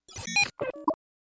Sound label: Screen Off Play, beeps & tones